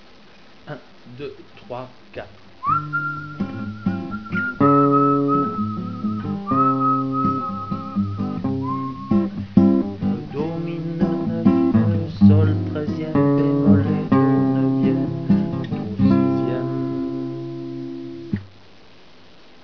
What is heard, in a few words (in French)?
BOSSA, guitare